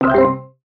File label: share complete large amount; mean share and balance